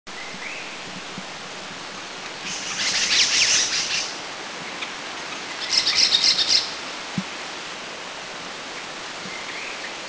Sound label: Philippine Bulbul; Philippines